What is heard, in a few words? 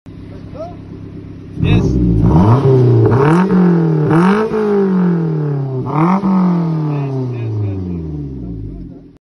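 Daihatsu, car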